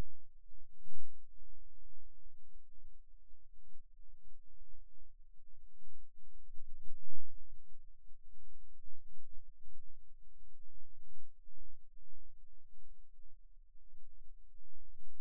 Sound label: Rhythm Wave, eeg seizures